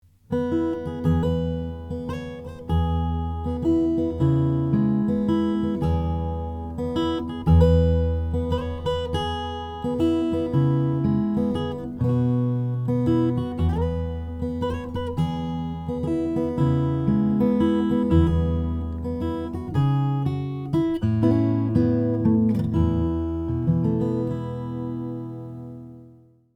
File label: gerber sd ziricote